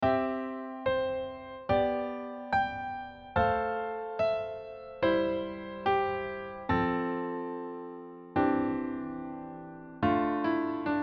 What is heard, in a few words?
diatonic chordtone